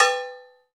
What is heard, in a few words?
COWB, Cow Bells, Roland